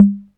mid tom drum sound g key, tom tuned to the G note. Loudest frequency: 205Hz; tom drum samples